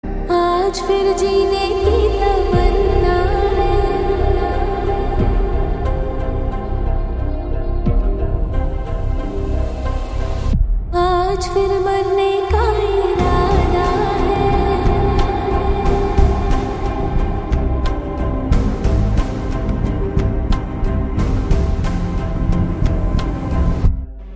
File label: Slowed Reverb